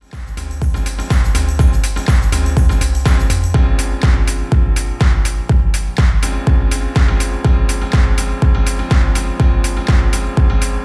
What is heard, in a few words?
computer jazz